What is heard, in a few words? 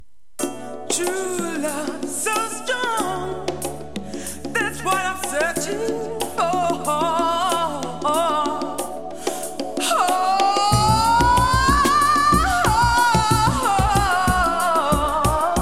chimes; Columbia; スコットランドのR&B〜ジャズ・グループの90年作